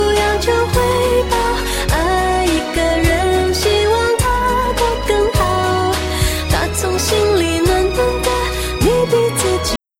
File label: 48000 mono s16 le